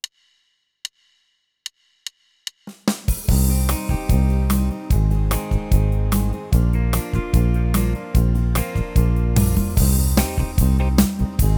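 style pop and rock vintage guitar pop, Yamaha tyros3; vintage gtr pop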